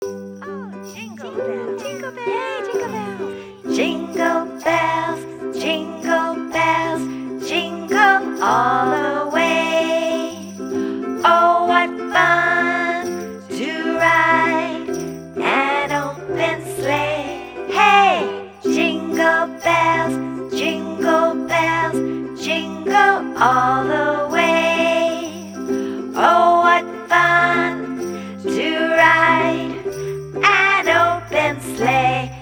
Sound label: Jingle Bells Sing it; kidsone